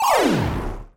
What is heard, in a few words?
ball out, pinball